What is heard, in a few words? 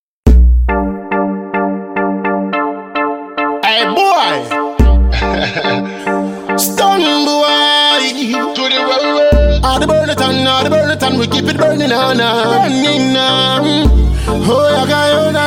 Riddim